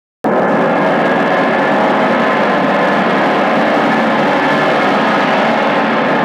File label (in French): grand stat forte, GONG VIBRATION